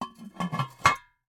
Cookie Jar, ceramic, glazed, irregular shape, hollow base, hollow top, rough, resonant, tonal, close; medspeed; Eiravaein Works; Vaeyan IV